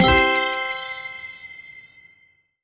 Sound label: Bonus Sound; Fruit Chutes